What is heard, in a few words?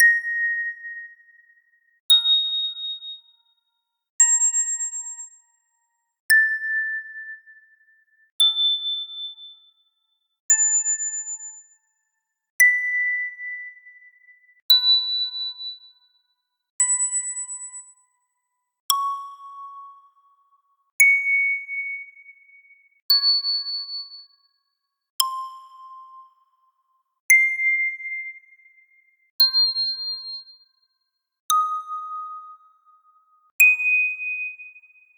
ding, instruments; fischinger